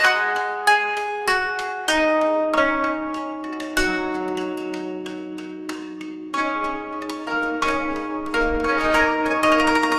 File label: japanese traditional, musicgen